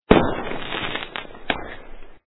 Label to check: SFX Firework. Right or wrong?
Right